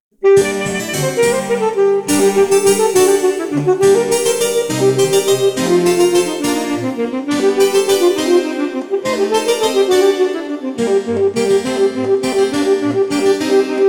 Sonata Presto; Eccles

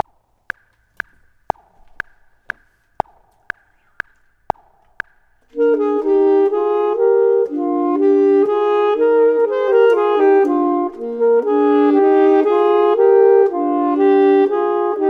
both, Saxophone and Clarinet; voxman

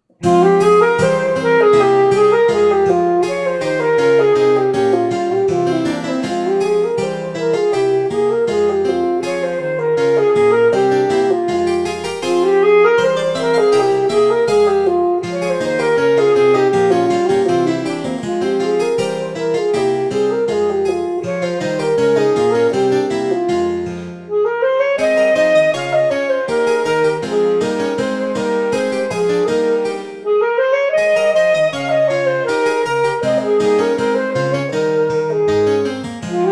Gavotte; Bach

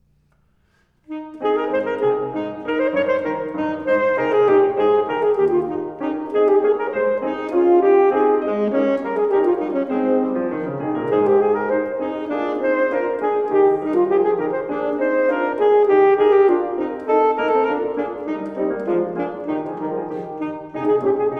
Sonata Allegro; Handel

